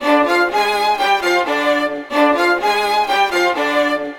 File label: violin; childsplay plugins; FindsoundData